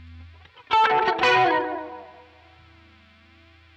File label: quilter solo